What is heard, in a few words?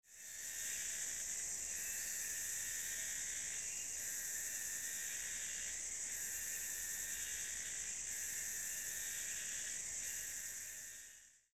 cicadas clumsy at night